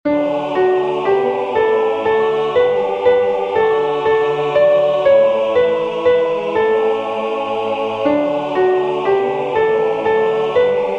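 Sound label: Come Holy Ghost SATB Soprano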